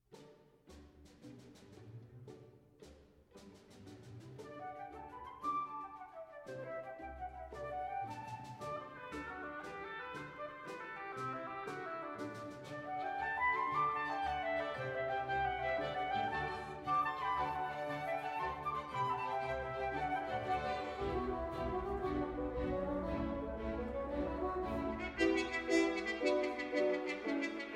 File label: Square Dance (Celtic-American)